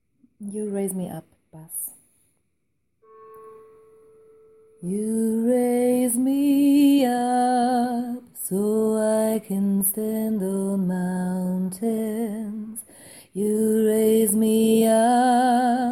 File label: Bass